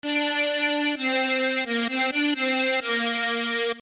Scratchy

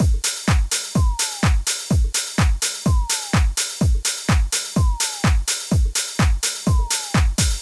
Drum Loop Full 126bpm

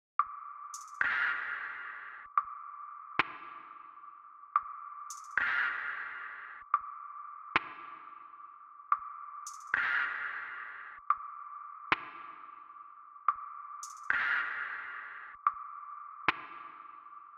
Percussion; Late Nights

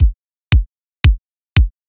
Kick 115 G Wet

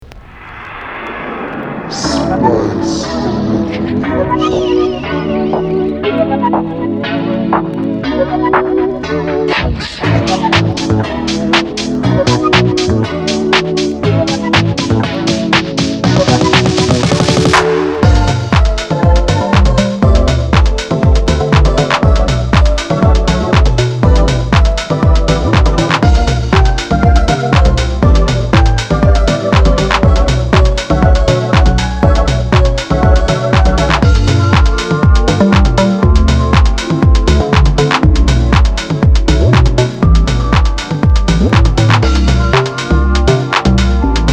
Lofi House

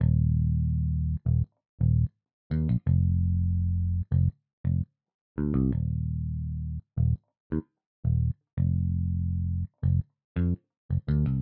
A 168bpm bass; Bleu Moon

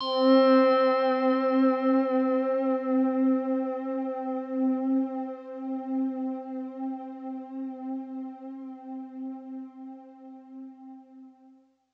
Pad

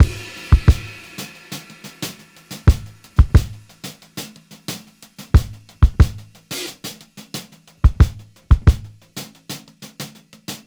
90 drum loop screwed; frosty

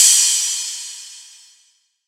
Crash Engine

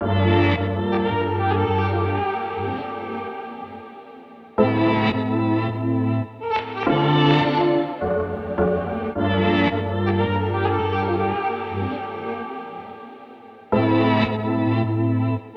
105 melodic stack harm guitars Gmin

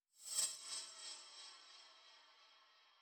spells

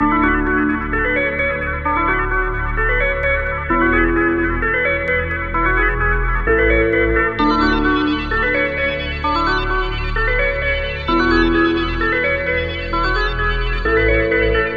130 Drippy Loop A

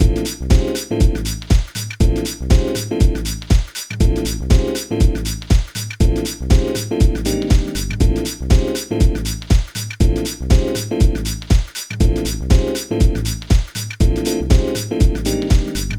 Groove 120 bpm, A; Zenhiser

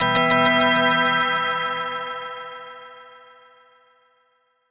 E Keys Organ Chop

